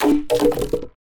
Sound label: firewood break